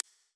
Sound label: deck ui slider up; steam; steamui